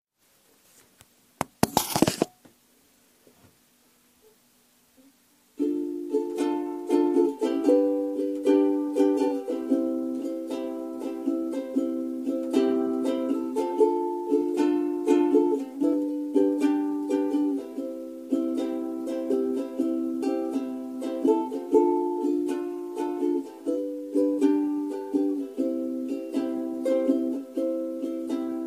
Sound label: na ukulele cover